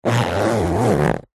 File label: office pencil case zipper